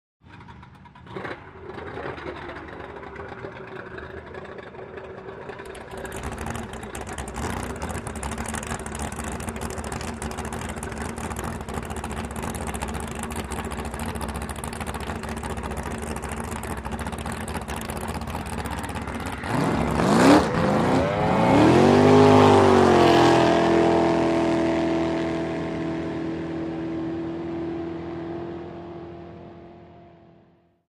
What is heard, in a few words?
Drag Boat Start revs